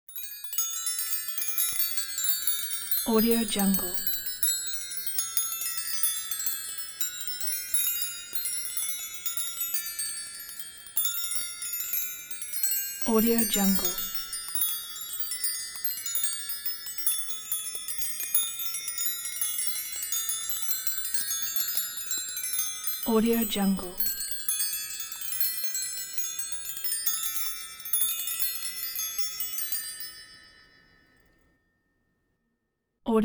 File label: chime random; rhumphriesdc